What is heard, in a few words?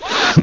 snuffle, Human